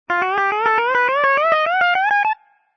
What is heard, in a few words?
GUITAR COMEDY